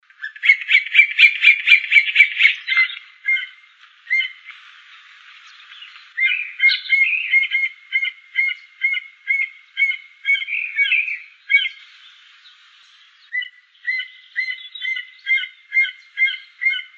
voz carpintero campestre

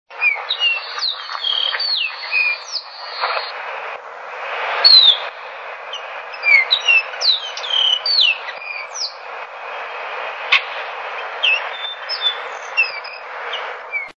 voz tordo músico

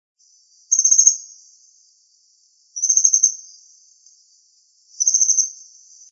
caburé

